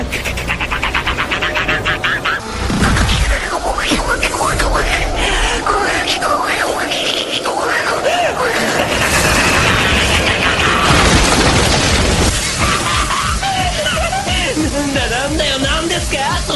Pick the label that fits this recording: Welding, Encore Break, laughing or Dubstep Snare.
laughing